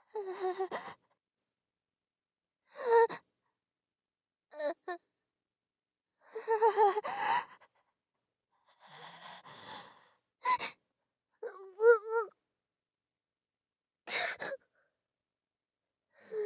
痛みがない腕の切断; 効果音なし

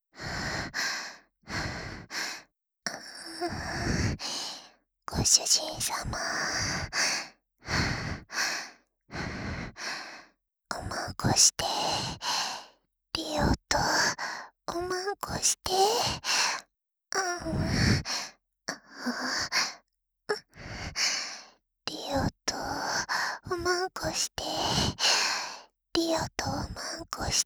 喘ぎ; エッチしよ; ハーレムオナサポ 4人のメイドがご主人様のオナニーをお手伝いするバイノーラル～文、千曜、名月、莉桜～

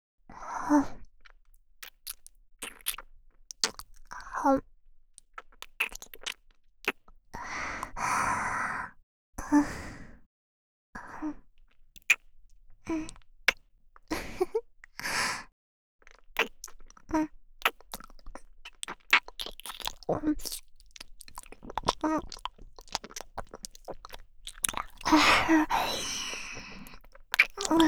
耳舐め他, ASMR; ＳＥなし

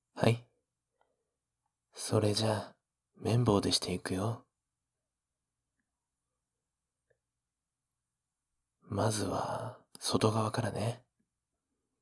右綿棒

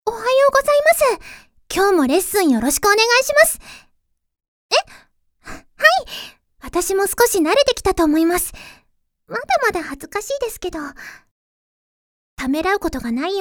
フェラチオ ちゅぱ音 レッスン, ASMR

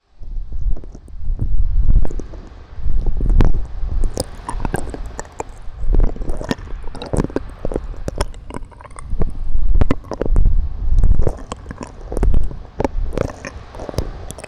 右耳奥舐め, ASMR

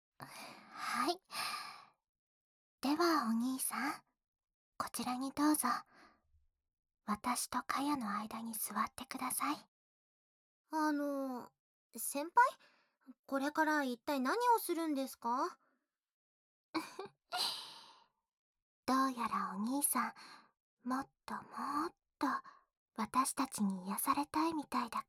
くちゅ音無し, 両側から耳舐め＆オナニーサポート

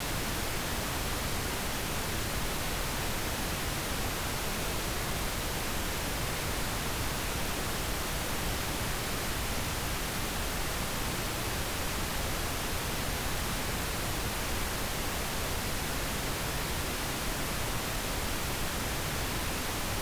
ボリューム調整用ピンクノイズ

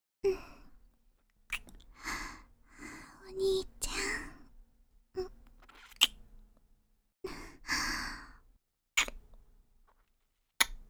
キス (ラブラブキス)

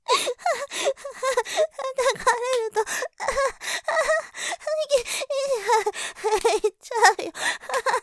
絶頂寸前◆叩かれると、イッちゃう、イッちゃうよぉ, ASMR; モノラル; ループで楽しむ！シェフの気まぐれ抜き抜きコース

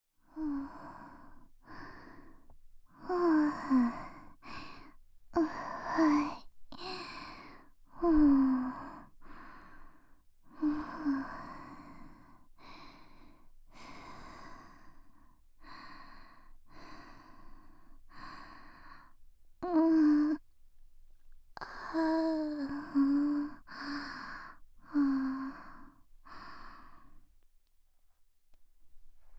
寝息ボイス(左側)

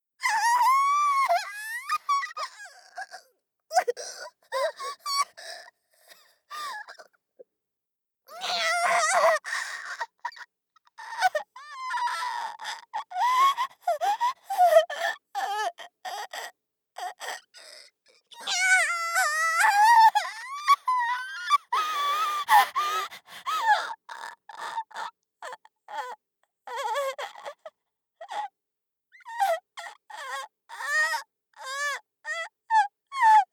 爪の間に刺す; 効果音なし

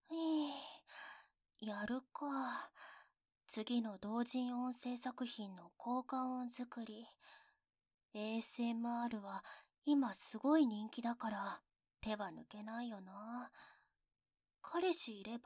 耳かき音; 耳かき音制作

